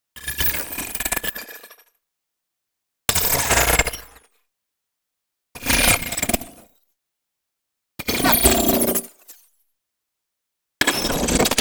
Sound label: NEON ZAP Ice Burn; boom library